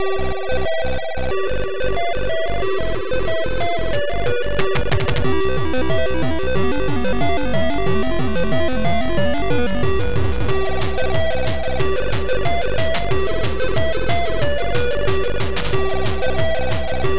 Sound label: RAVE